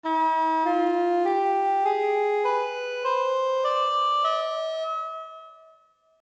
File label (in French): el houzam, La gamme el houzam; GAMME EN MODE DE MI = SIKAH; D'origine arabe, on peut aussi la nommer sikah